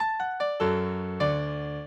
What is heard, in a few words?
minuet, mozart